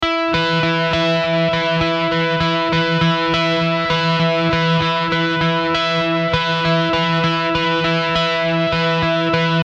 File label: Helios II EQ